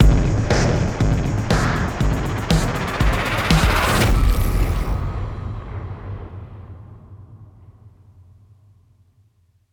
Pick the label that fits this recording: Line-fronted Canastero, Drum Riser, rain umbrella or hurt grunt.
Drum Riser